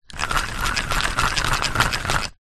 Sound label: leisure fishing reel turning reeling small; zapsplat